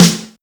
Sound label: smooth snare one shot f key